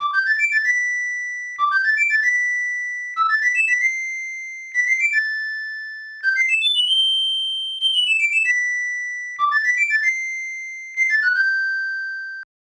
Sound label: Ocarina Song; Super Nintendo; Legend of Zelda, The - A Link to the Past